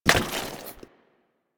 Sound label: Monster Pickup Impact; Warframe; Infested